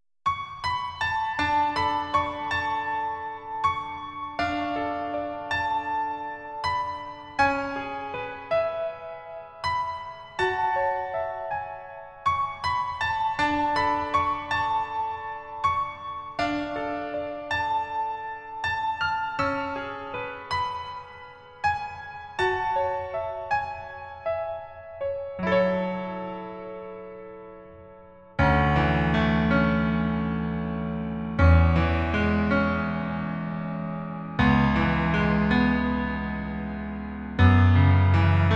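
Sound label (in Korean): Piano version 남자키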